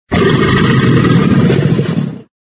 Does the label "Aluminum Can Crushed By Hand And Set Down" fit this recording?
No